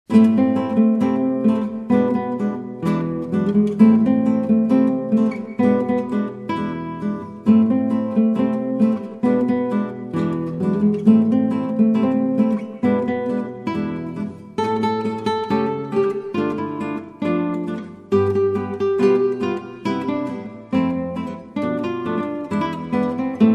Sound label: Tango